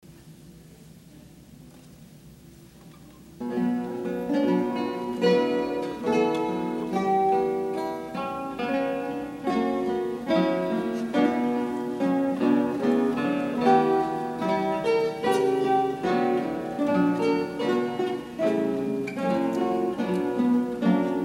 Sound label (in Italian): menuetto